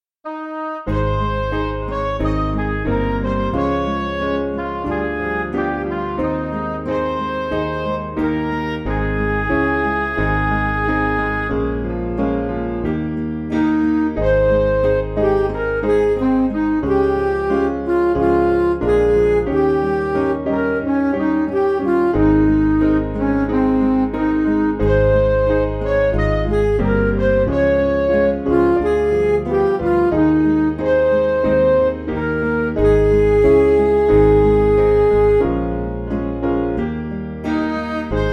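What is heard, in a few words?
Music Backing Files for Churches; If I Have Wounded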